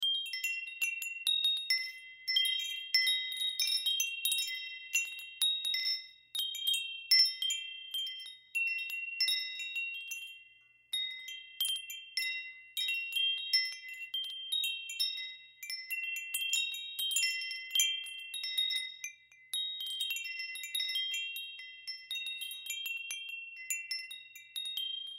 Wind Chimes, Wind Chimes Ambience Musical; Loop; Chime Sound Effects; Multimedia; Foley; SoundEffects-Blastwave

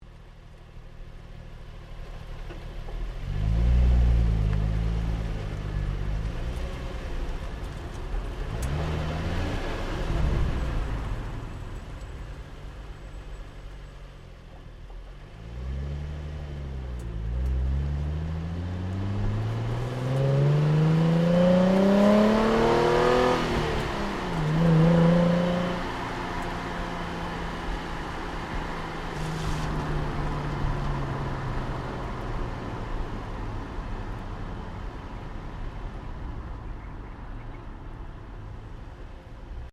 CAR NISSAN 350Z EXHAUST DRIVE, manual sportscar; Cars; Vehicles

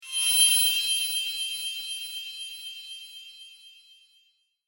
High End Sparkle Spell, Magic; fantasy sound effect; role playing games, magic videogames

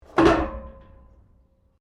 PIPES METAL IMPACT METAL; Materials

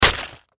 Impact Crunch Hard Hit Rip Tear; Digital; Sound Effects:Impacts; Blastwave

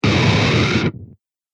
Scream Total Distortion; Screaming Sound Effects

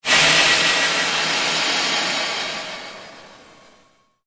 Created Pulse Fade, Rise And Dive Sound Effects

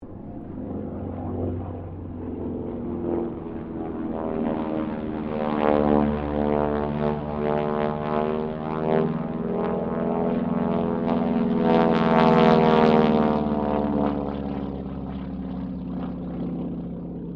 Harvard squadron 4 pass, stereo; Mixed aircraft squadrons; Airplanes; Sound Effects:Vehicles

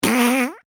Human Fart

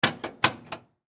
Pinball Flippers; Arcade; Arcades; Sports; Blastwave

